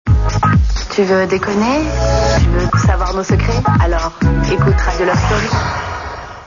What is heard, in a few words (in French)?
jingle radio; loft story